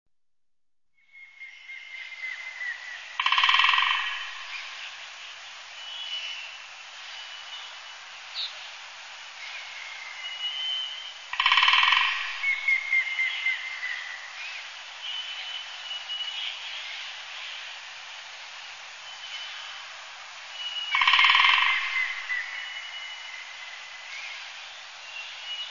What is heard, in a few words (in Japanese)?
yamagera, birds